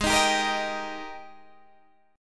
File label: G sharp Major 7th Suspended 2nd, Guitar, Standard; 16,18,17,15,16,15; JGuitar